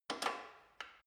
gym bicycle saddle unlock soft

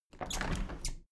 window handle plastic squeak open regular; objekte; fenster

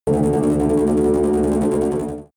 buzz rattle; energie

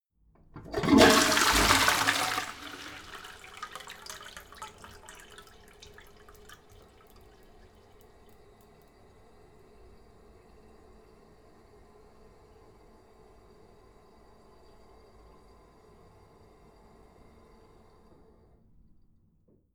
toilet flush short full inflation; haus garten

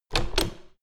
door plastic handle use hard

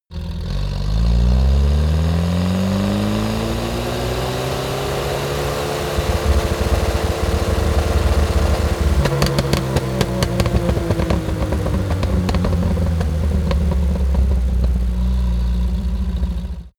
car uaz 469 fast acceleration clatter

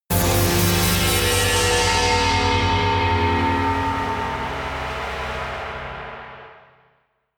synth slice

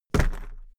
briefcase plastic drop; industrie wirtschaft; bueroarbeit

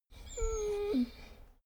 dogo argentino whine short; natur-tiere; hunde

